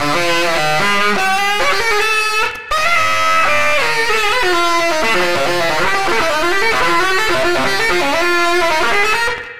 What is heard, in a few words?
Tenor Effect, sax; Effected; Sonic Foundry (Sony Creative Software); Jazz Solos and Sections